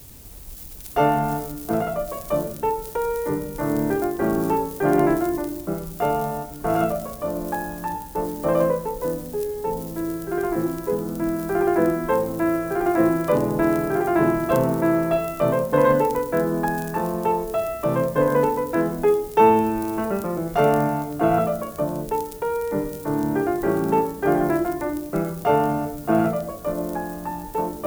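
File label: Scarlatti Sonata for keyboard kk64; Scarlatti Sonates pour clavier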